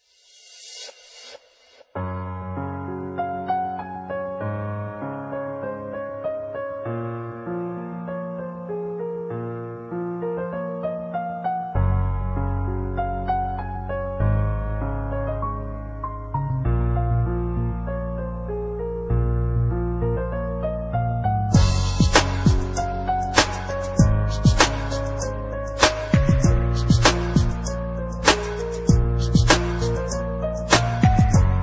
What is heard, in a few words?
love instrumental beat